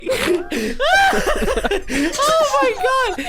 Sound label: laugh track; Snapcube Sonic Adventure